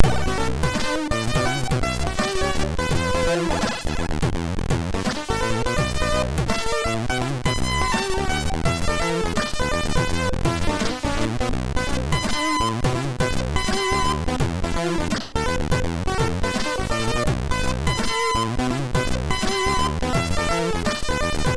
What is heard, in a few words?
Slower Europop, sid MOS6581R2; A Slower Europop Thing